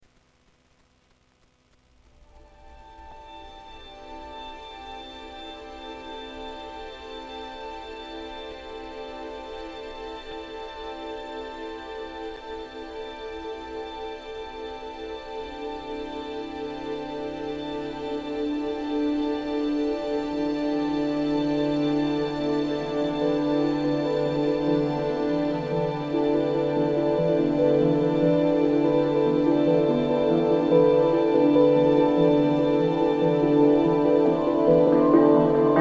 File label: Grey Rainy November Ambient Mix